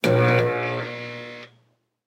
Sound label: Hemogen Amplifier Working Start